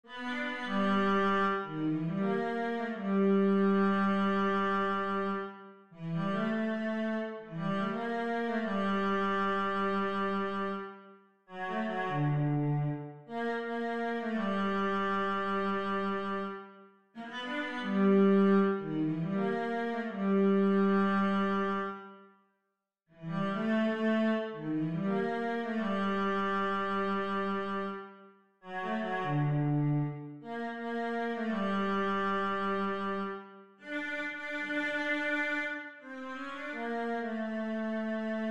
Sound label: cello